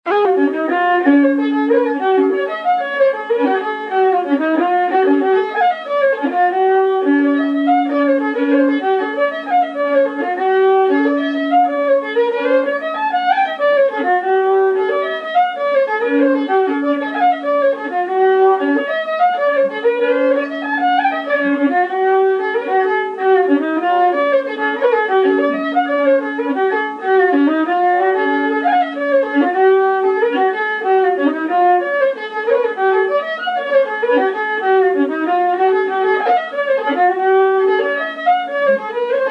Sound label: jig; Down the back lane